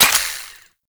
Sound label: Miter Reload; Tenno